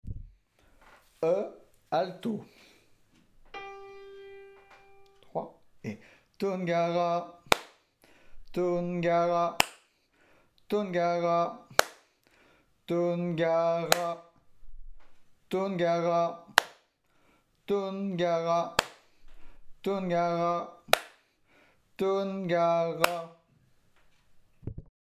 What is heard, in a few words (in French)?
alto; Tunggare E